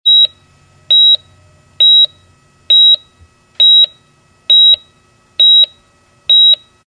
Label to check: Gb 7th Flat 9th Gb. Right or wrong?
Wrong